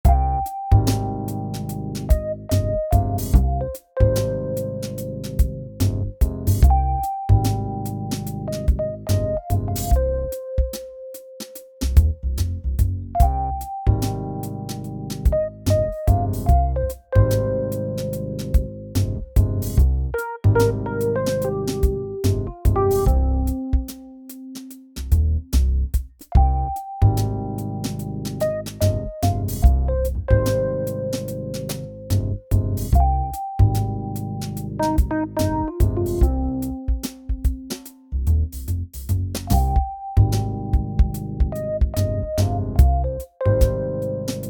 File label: smoothjazz